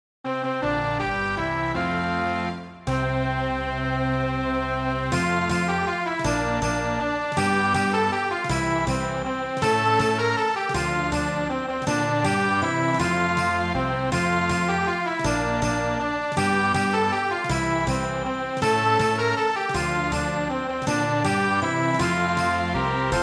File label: we wish you a merry christmas with horn